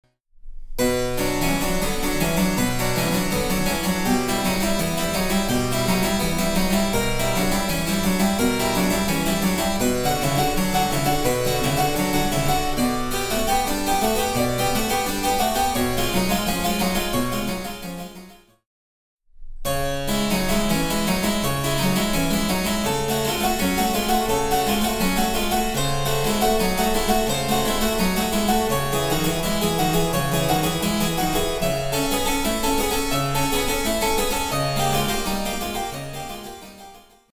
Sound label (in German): Cembalo; Mark Lindley WTC 1